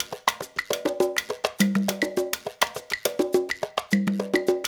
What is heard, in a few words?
LOOP, Percussion; InVision Interactive; Percussion Slam; SULTAN